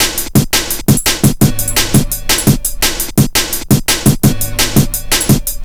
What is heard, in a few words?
170, Drum Loops, Drum & Bass